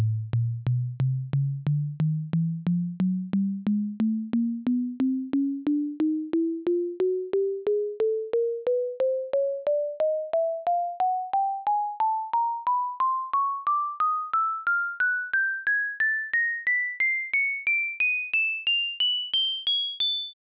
All Notes

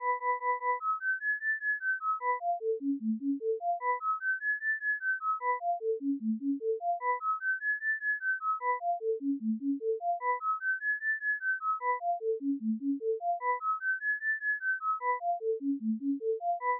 Sinusoid

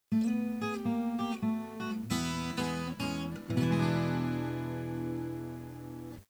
12 string GUITARS

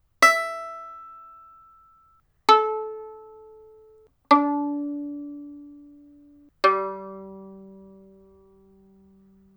violin plucked

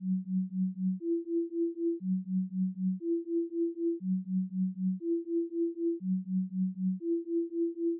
Audio Graph Square Wave

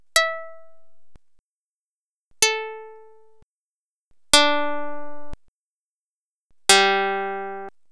plucked

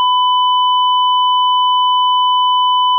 Square Wave

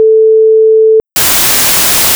tone noise

